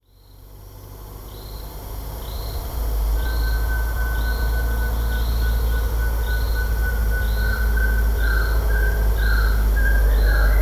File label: car with bird; field recordings; Vietnam